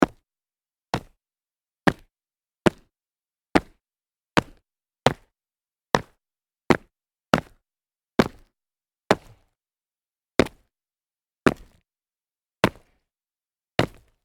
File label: sneaker large jumps concrete